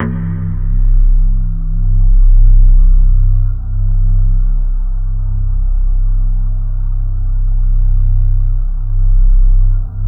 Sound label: C2, Synth; 90 s Sample CDs; NiceBeats; SynthLine VOL-23